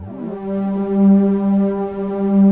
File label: PADS; Pro-Rec - Synthsonic; Z1 PADS